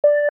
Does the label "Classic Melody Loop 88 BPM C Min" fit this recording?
No